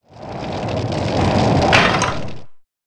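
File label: skeleton dead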